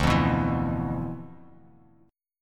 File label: D Minor 6th Add 9th D, Bass Standard; Dm6add9 strummed; JGuitar